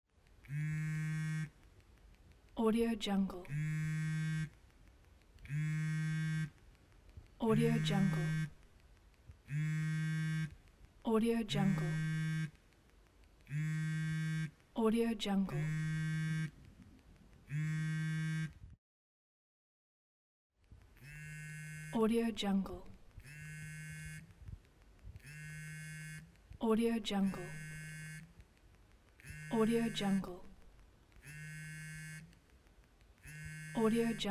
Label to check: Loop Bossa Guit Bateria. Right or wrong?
Wrong